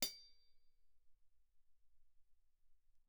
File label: hihat, bell, mf; Percussion; cymbals